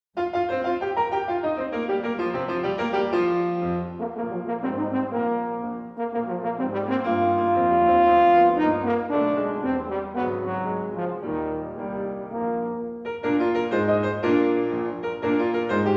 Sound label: Rondo in B flat major, horn and piano; Canto Lyric Works for Horn and Piano